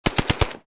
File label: AK 47 firing multiple bursts